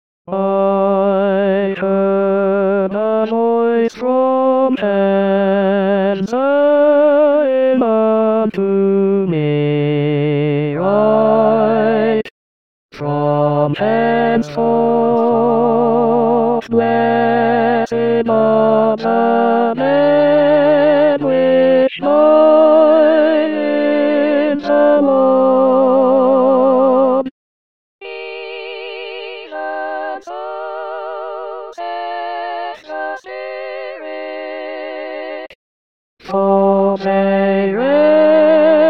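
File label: I heard a voice from heaven Tenor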